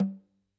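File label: Snare Off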